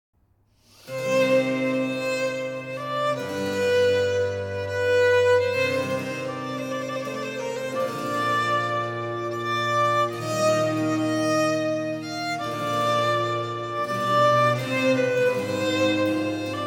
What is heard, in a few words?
Baroque Violin Sonata La folia; Op 5 No 12